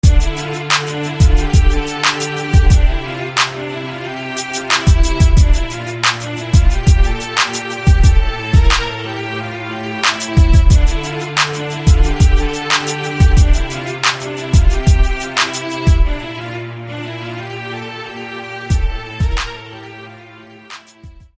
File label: Relaxed Violin; SFB FX